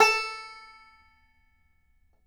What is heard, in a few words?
banjo A4, banjo notes; Sampleheads - Whole Lotta Country 1 & 2